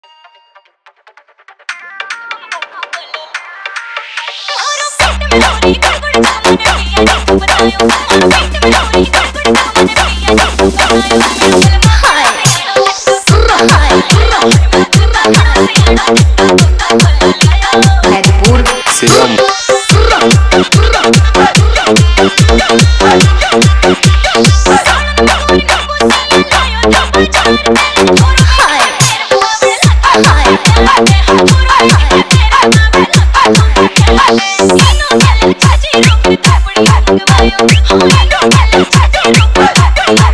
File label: Haryanvi Dance Remix